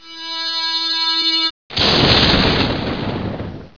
player death

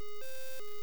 new mail; Eudora

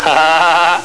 laugh

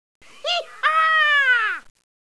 Yeehaw

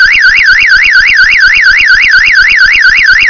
Medium pitch fast siren

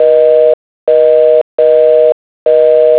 busy sign

